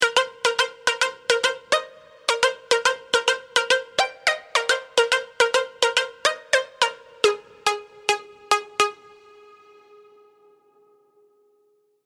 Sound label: serum plucks; chiqui audio